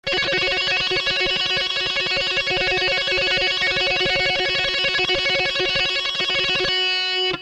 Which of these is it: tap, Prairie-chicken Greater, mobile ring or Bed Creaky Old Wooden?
tap